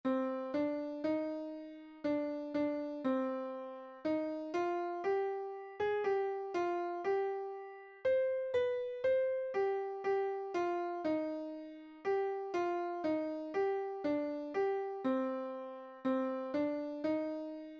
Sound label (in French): Les flocons piano